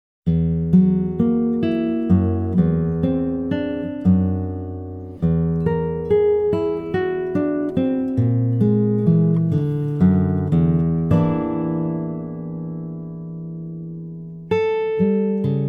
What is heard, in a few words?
Whole Tone